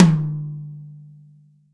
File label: TOM, Real Drum Kit, FUNK KIT